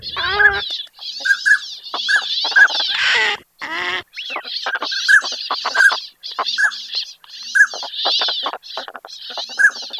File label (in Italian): CODONI